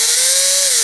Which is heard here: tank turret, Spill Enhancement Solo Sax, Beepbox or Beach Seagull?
tank turret